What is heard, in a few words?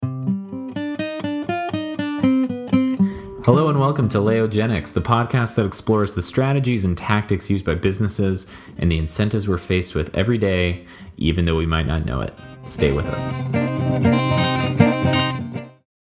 Acoustic